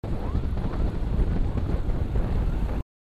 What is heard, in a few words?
loop